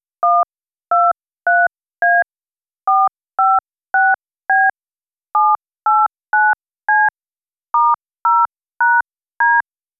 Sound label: dtmf